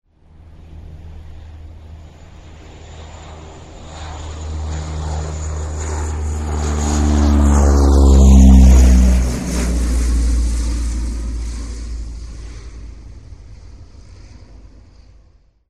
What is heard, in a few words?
hercule